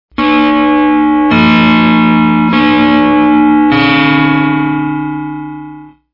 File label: ring